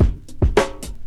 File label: Drum loops, Boombap